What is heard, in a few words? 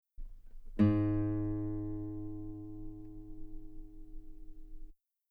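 guitar G sharp 3 very long forte normal; bananenkeyboard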